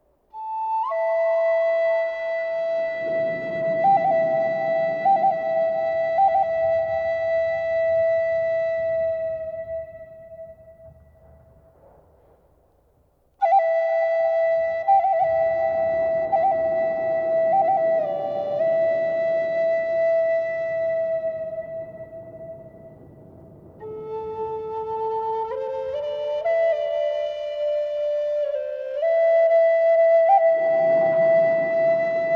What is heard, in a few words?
Rain Clouds Gather in the Mountains, Native American Flutes & Sounds of Nature